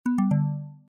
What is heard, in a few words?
drill down